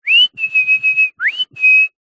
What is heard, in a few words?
human whistle mouth short call animal; zapsplat